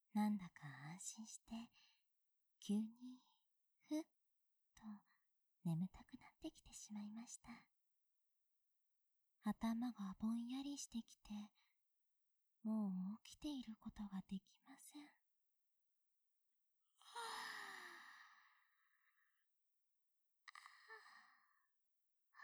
安眠

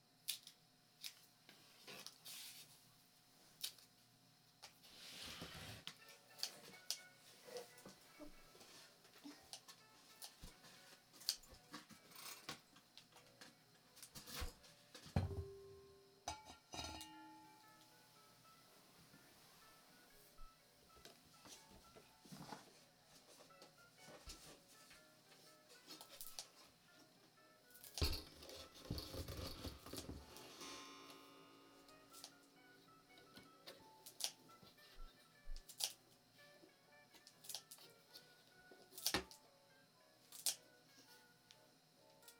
おこたと時計とごはんの支度（ループ可）; おこたと時計とごはんの支度mp3

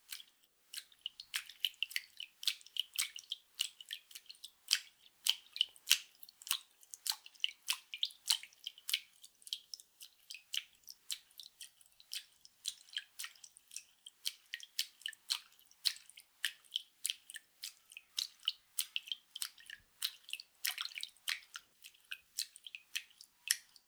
パンパン 遅, 水音効果音

